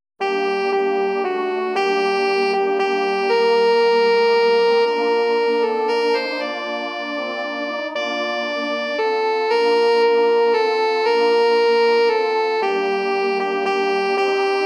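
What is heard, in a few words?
Cantate Domino, G minor, Soprano Instrumental; Pitoni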